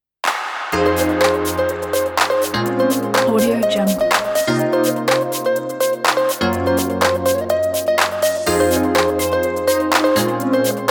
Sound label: lounge house fashion